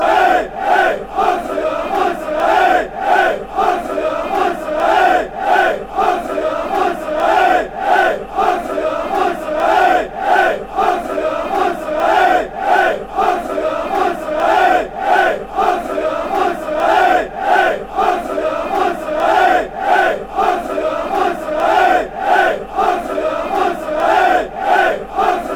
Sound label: come on viola, chant